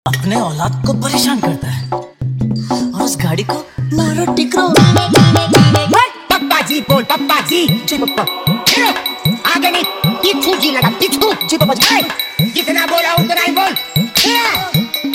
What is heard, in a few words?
Old Is Gold Hindi Dhollki Remix